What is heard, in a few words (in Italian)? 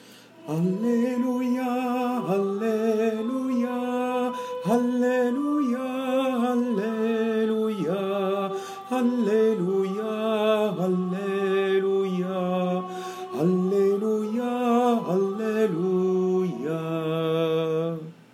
alleluia soprani